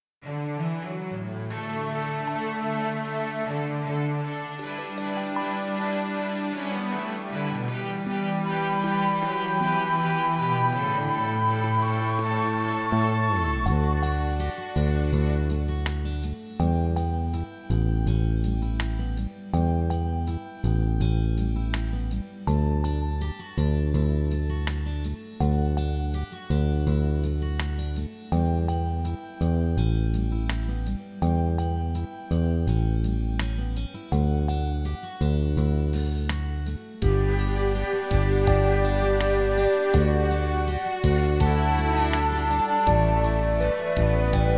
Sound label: inst